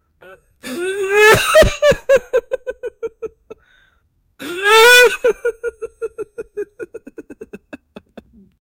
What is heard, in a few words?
a male adult crying